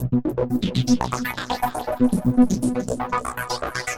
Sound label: NRG Arp, trance